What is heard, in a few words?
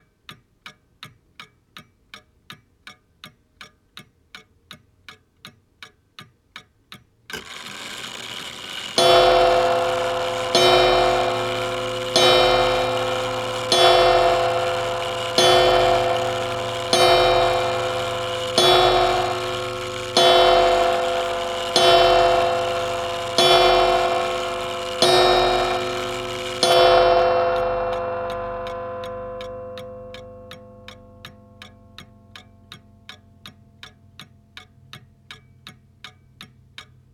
Old Clock Strikes 12